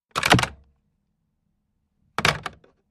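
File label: Telephone Pick Up Hang Up Heavier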